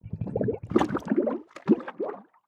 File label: marsh bubble